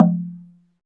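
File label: SLIT DRUM F3 P; SLIT DRM1; Heart of Africa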